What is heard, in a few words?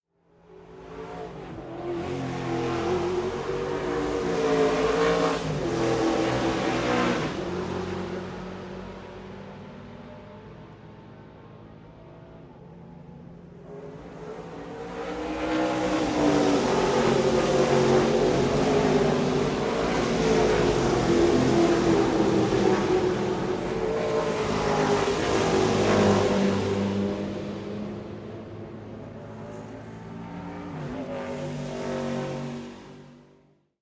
Autos, NASCAR race, two packs passing, 3D binaural; ASMR audio only